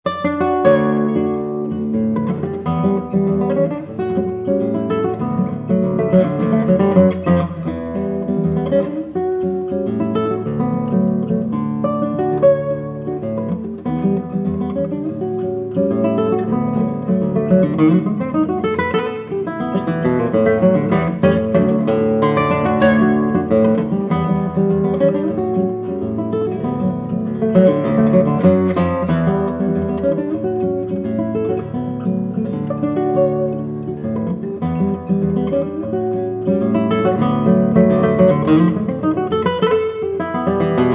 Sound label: Sounds of Bells; Pernembuco